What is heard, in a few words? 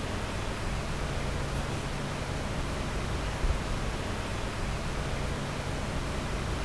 fastline station, ambience